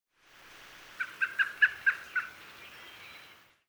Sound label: american robin call note; paul marvin